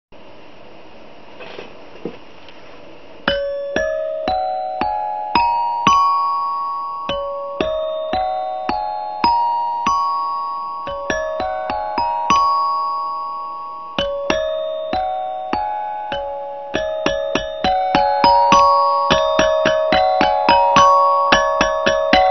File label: Alarm; Haiku Chimes